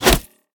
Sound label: roman ranged bow player hit; smite; npc; roman archer